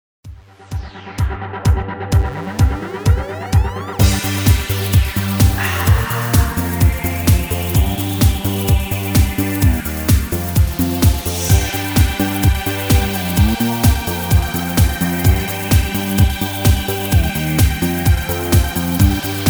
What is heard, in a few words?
Dance Of Moscow 128bpm